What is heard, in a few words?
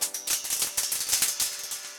Rain Tambourine; Ableton; Mood Reel; Playful Percussion